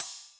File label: crash, Millenium MPS-400